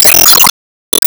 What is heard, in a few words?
Enemy Hourgrass Minion Active Shot